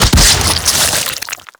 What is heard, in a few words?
Infested Eris Cyst Explosion Single; Destructable Cysts